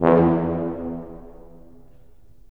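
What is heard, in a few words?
brass tuba; LA Composer vol. 3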